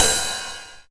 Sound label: Mellow Open Hat D# Key, Royality free open hi hat sample tuned to the D# note